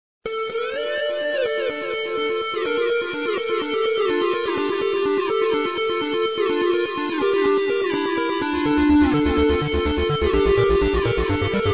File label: indie game music; vvvvvv